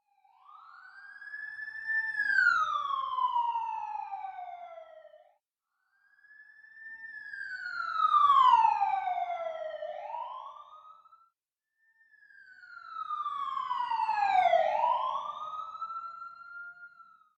emergency siren approaching

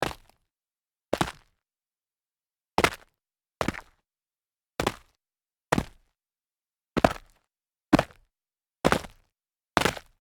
sneaker medium jump grit

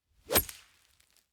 wood golf club hit ball

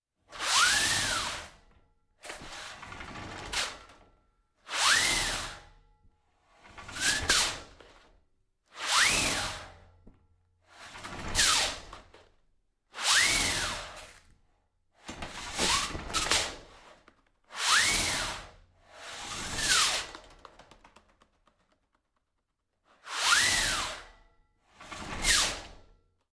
projector screen fast pull

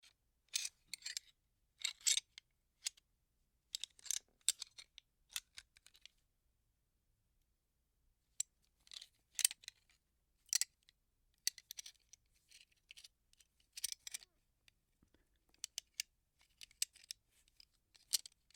gun parts handling

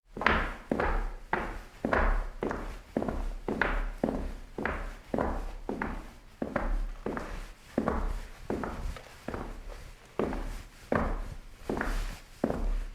walking cleats

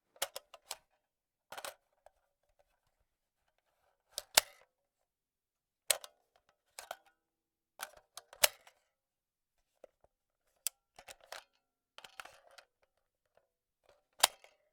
rifle magazine handle

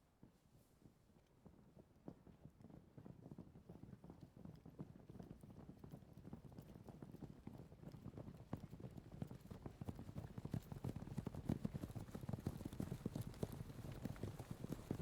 battle movement pass by

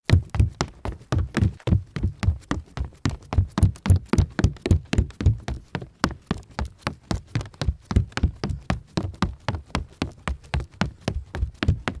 sneaker run hollow wood

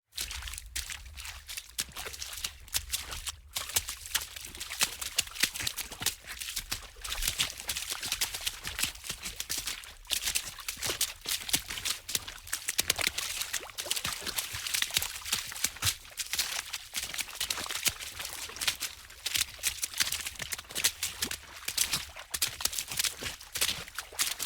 splashing water fast

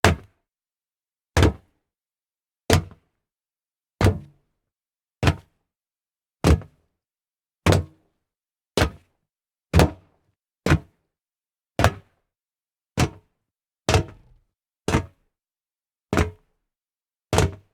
jump on solid metal

